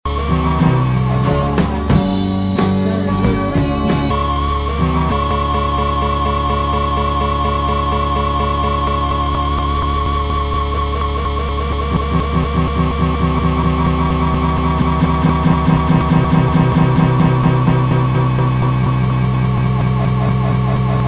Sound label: granular; granular ZEP